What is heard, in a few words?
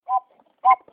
Train Toot-toot